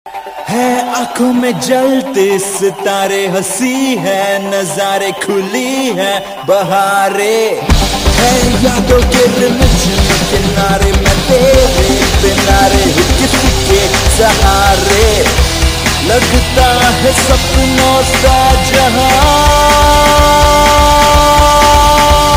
rock on launch pad ringtone

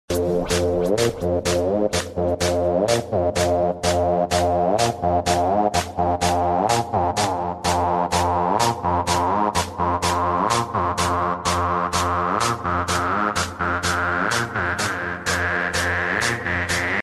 best house ringtone

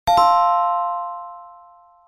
bell ringtone, apple sms ringtones

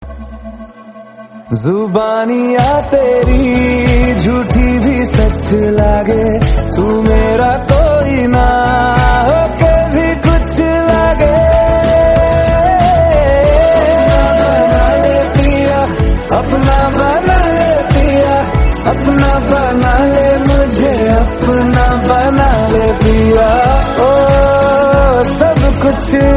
Hindi song tone ringtone; bollywood ringtones

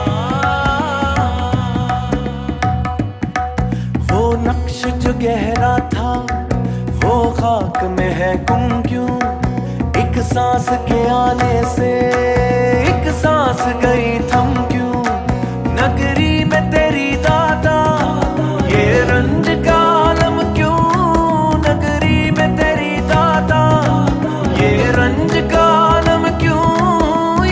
qawwali ringtone

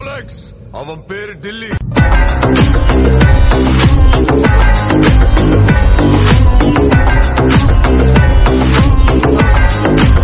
bombastic background music tone ringtone

